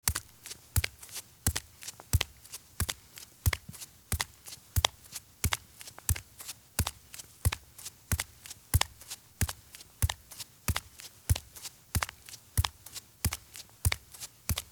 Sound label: Bare Feet Walk at Fast Pace on Concrete; Sound Ideas; Footstep Sound Effects